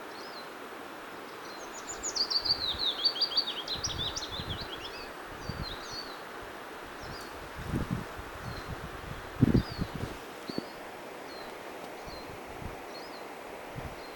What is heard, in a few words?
Willow Warbler